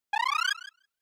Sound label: SNES RPG Magic Status